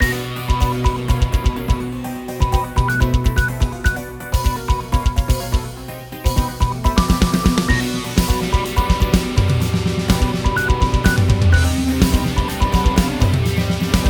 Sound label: music boss; spider-man-mysterio-rush-game